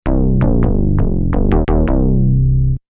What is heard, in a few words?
bass